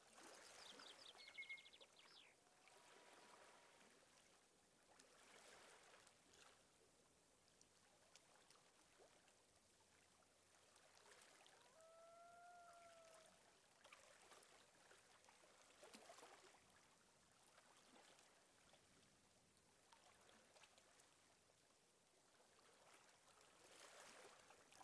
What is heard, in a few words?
Lakeshore